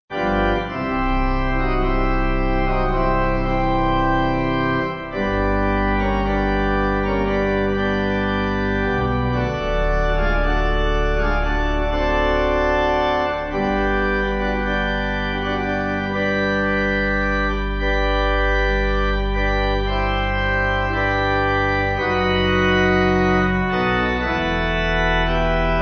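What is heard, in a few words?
Pipe organ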